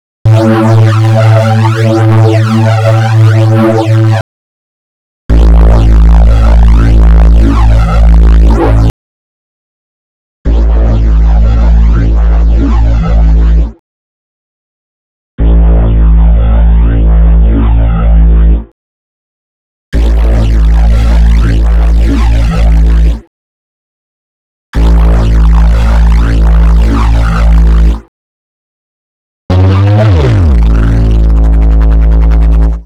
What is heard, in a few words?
alchemy bass morph